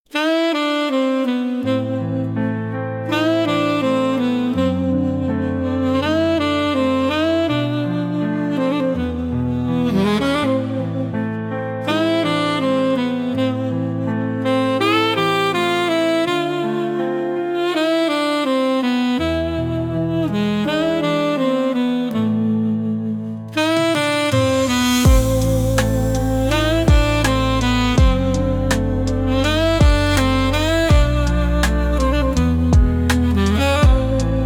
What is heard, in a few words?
midnight sax